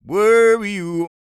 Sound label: SING, Vocal Planet Blues & Soul